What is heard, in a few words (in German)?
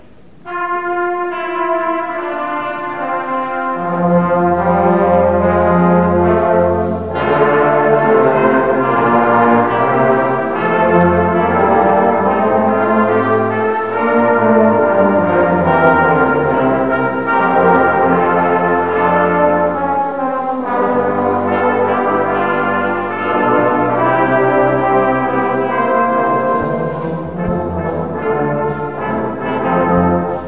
Jingle Bells, Posaunenchor; Adventskonzert 2007